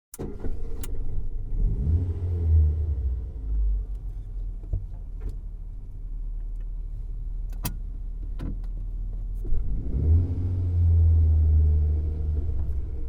Ford Fiesta, 1.1 litre hatchback int switch on, reverse, switch off; BBC Sound Effects Library